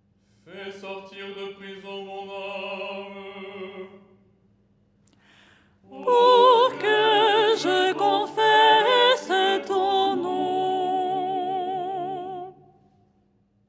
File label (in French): soprano; ton 3